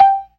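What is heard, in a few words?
organ, percussion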